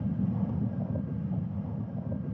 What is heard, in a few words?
push loop; CrossCode